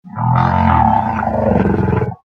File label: big whale